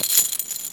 chain movement; pudge

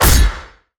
timberchain impact damage; weapons; dota; shredder

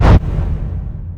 lance impact; weapons; spirit lance; dota; phantom lancer

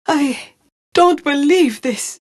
death; dota; phantom assassin

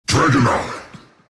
dragon attack; dota

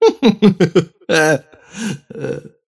wheel laugh; dota; kez

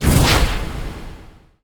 chilling touch cast; dota; ancient apparition

